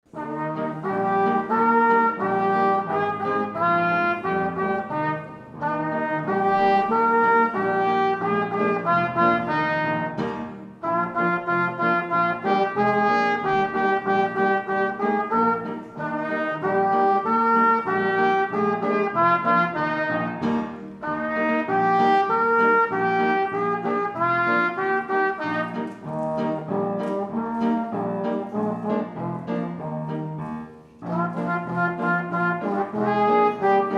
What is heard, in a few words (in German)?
Bläserensemble; Musikschule